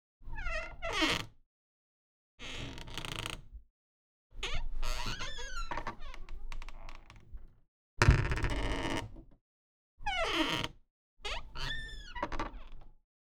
door household creaks squeaks

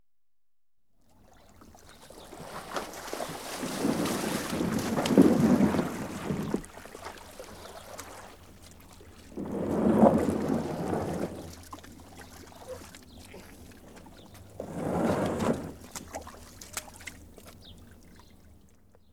Rowing Boat - 1 MAN Rowing; Ships And Boats; Hauli; BBC Sound Effects Library; Ships And Boats 2

